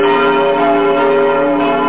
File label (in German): glocke